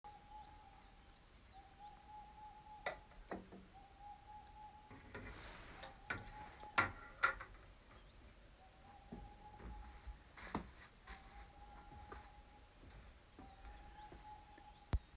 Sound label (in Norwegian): uglelyder